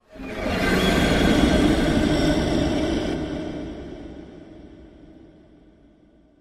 Anime Horror

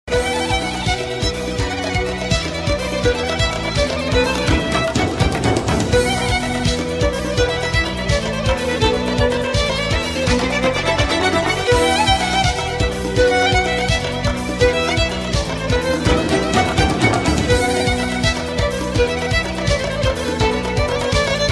Violin With Style

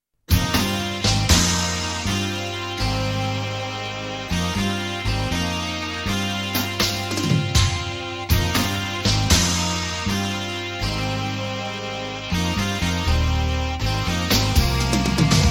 Instrumental Tone

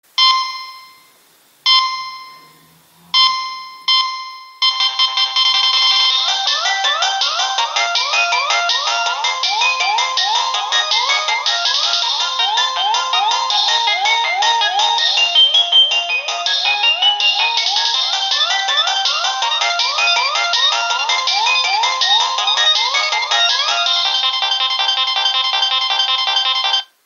acceleration

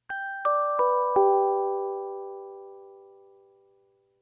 Notification Down

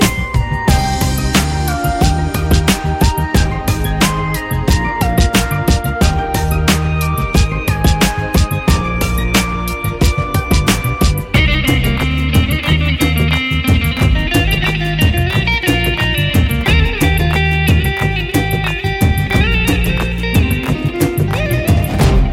Bgm Telugu

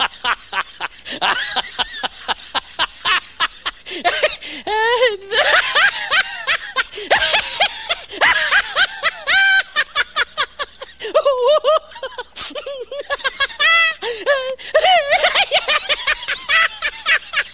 Laughing Lion

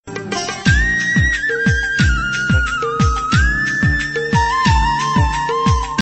Hindi Romantic Tone

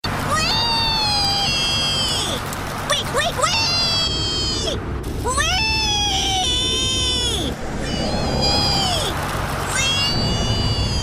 Wee Wee Piggy; Geico

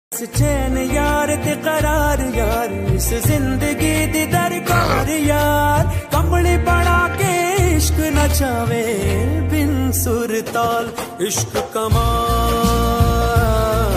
song ringtone; sadak 2 movie

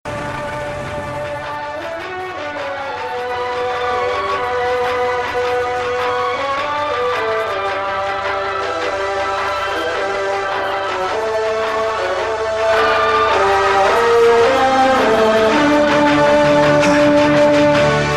Bgm

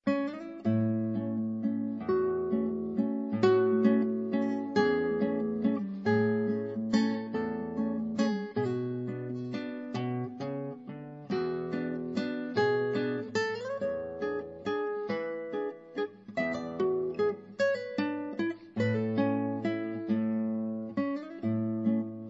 Nokia Guitar

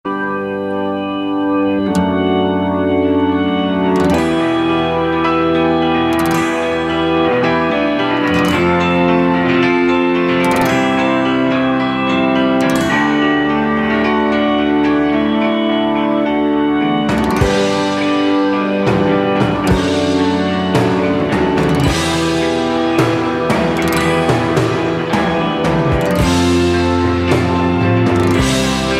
bird guitar ringtone

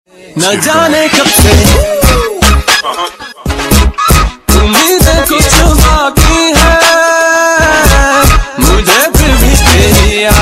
Hip Hop

